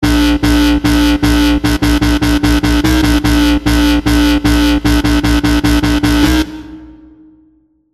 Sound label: COMP GARAGE WOB